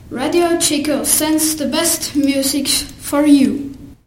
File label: Jingle; Herrenschwanden